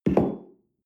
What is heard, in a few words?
espresso place tablecloth slide wobble